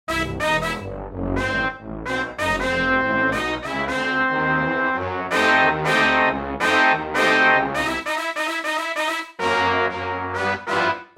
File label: Brass Combo, Roland JV-880; Factory Patches